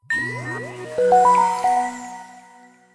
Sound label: Samsung Galaxy S Duos Startup